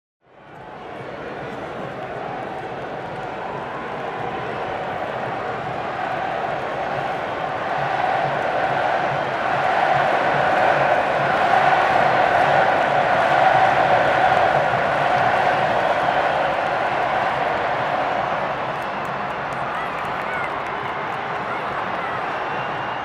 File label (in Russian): tolpa skandiruet futbol